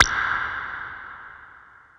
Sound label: Water Drop; FREE SFX PACK VOL 3